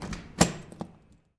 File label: door open; Golden Crayon Sounds